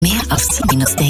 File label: gong